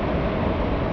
a burn; asciisec

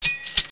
kaching; asciisec

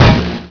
ast hit; asciisec

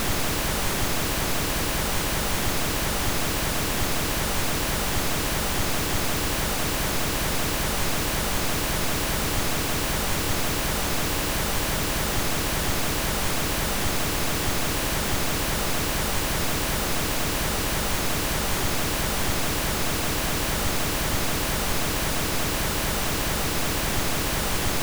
pinknoise; Gravity

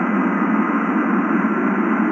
Jet Loop; GLScene; AirBlast

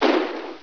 Decoy Drop; GLScene; AirBlast

